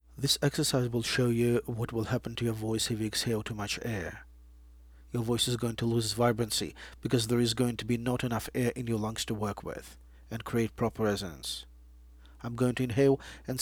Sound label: exhaling